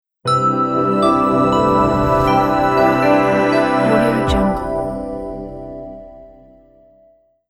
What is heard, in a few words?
modern bright logo reveal